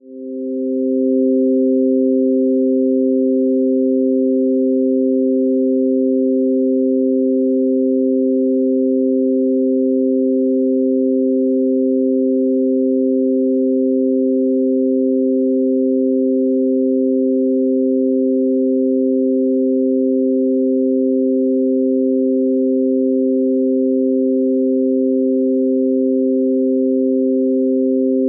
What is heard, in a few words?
music kids light